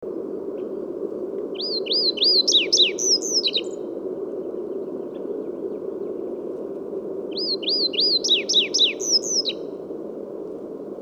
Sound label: Ocyris rutilus song; passeriformes; emberizidae; emberiza rutila; birds of the ussr